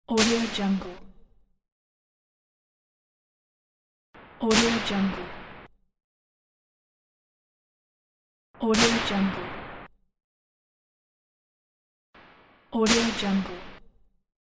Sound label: hammer metal